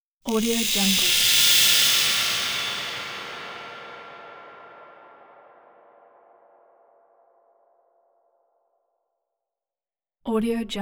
sharp crackle feedback stinger; hollywoodedge

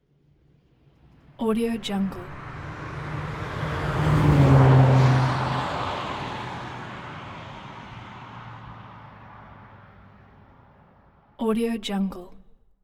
passby sportscar single; soundbits-soundfx

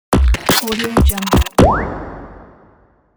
digital glitchy water drop logo

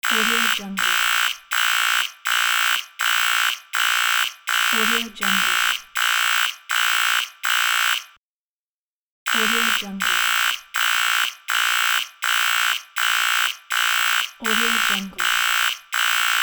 warning alarm; andrewsound83

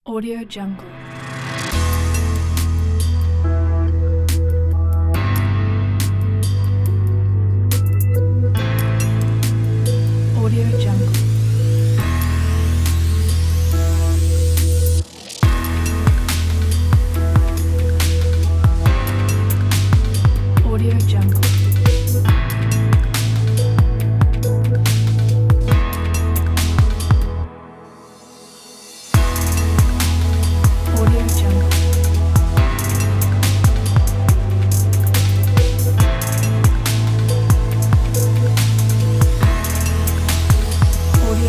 glitch

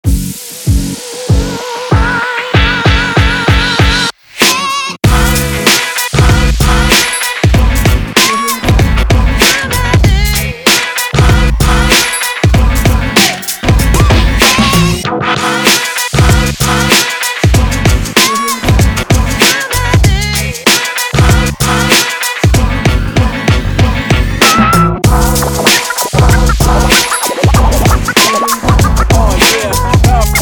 hiphop on hiphop

